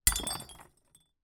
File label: impacts broken wine glass shard smash in cardboard box